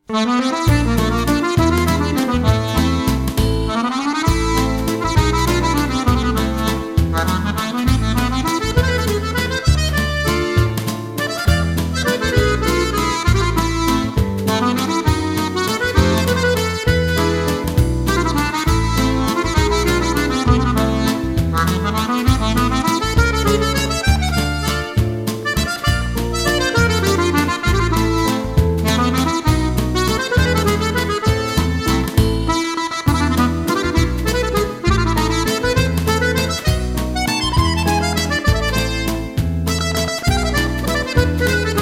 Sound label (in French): Valse